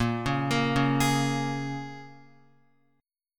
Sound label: Bb-Minor 7th-Bb Guitar; Bbm7 arpeggiated